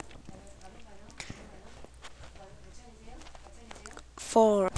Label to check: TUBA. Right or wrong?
Wrong